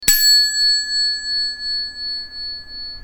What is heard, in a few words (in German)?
Fahrradklingel Klangschale XL